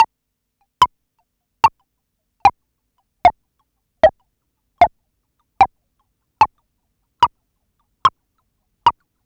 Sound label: Insectus, Hard & Loud Techno; USB Soundscan vol.01; AKAI